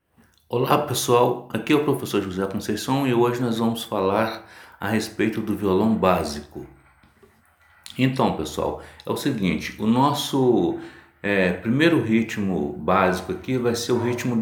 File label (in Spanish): Ritmo Marcha